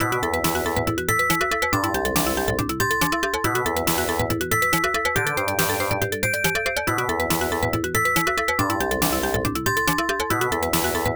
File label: Battle BGM (Normal); Grandi Florum; X68000